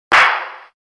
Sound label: megaphone